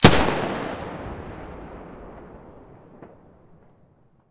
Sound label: muzzle ump45 mono, weapons